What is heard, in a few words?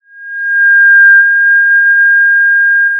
Sound of a police car siren